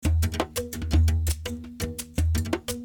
波琴

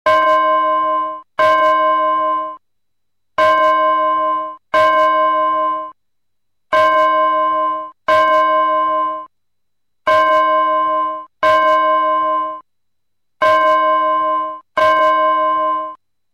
钟声

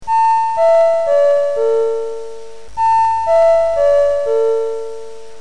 钟声; 广播室铃声系统

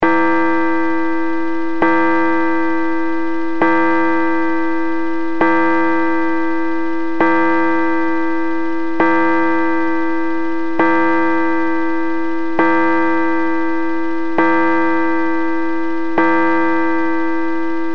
当, 钟声; 广播室铃声系统

